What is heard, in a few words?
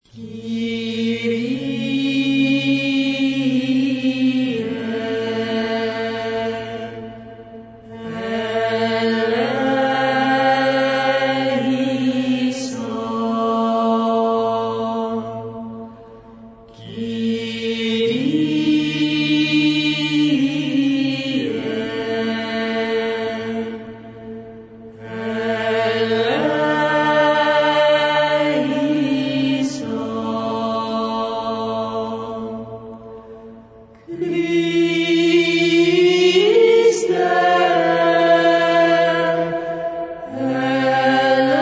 Kyrie Elieson; Calls Invocations